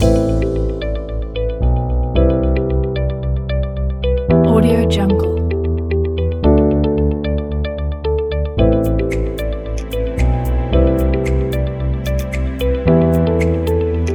upbeat new corporate

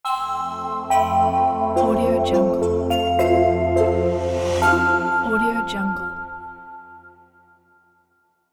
soft sky logo

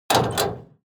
object metal lock metal door

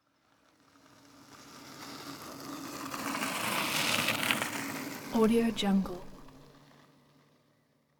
bicycle passing on forest road

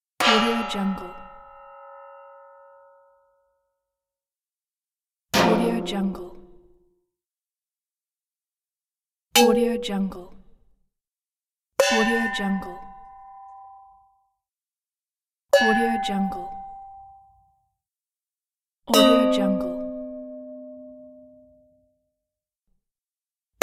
cartoon clangs; eternal-future-productions

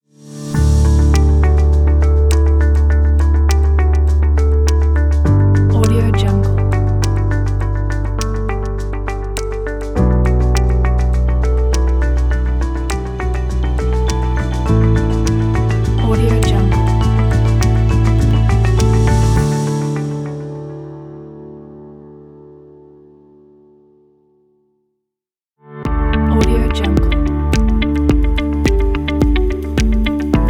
ambient flower ident pack

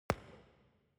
object banger explosion hollow

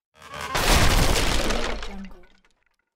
coffin opening

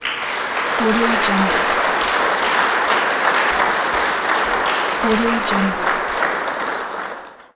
crowd applauding in auditorium

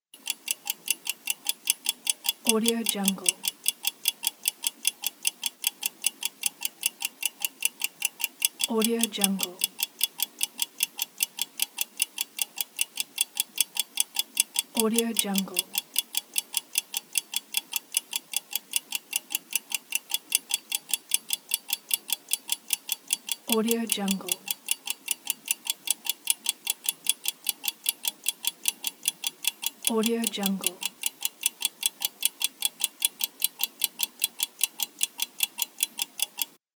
clock

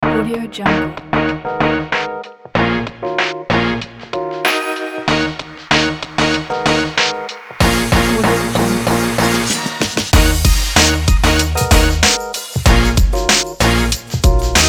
hip hop; aveoaudio

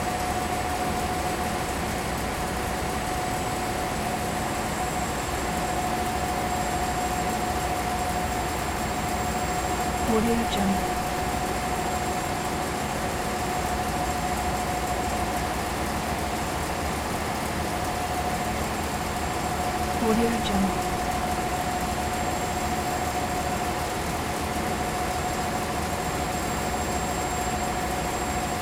ferry boat engine room turkey loop

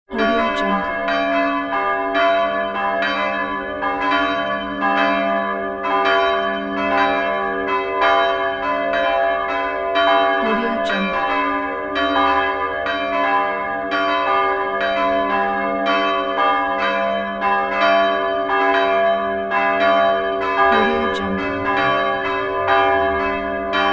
triple church bells campanile

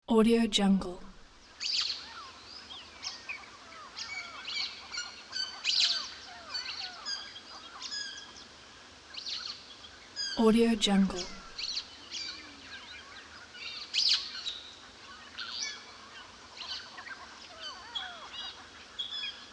chirping birds

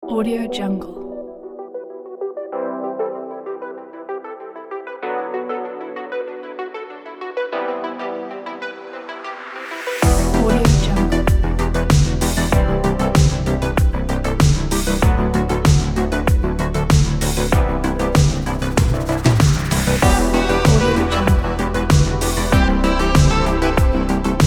nostalgic midnight 80s synthwave; osynthw

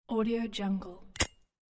lighter

